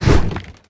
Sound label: melee swing; argus; smite; hera